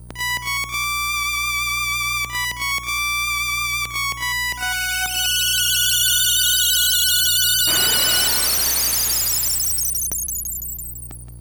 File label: Full Moon Cello